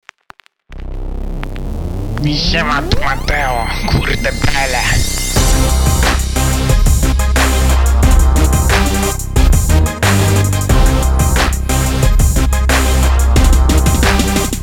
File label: Beat